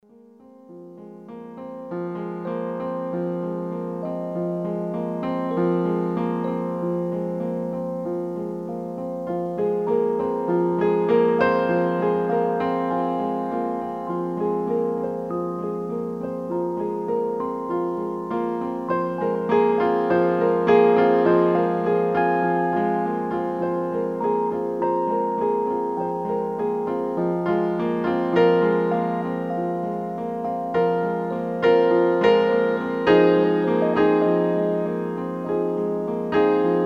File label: Mountain Bells